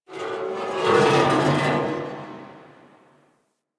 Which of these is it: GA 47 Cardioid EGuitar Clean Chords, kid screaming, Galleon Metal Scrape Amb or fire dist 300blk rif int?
Galleon Metal Scrape Amb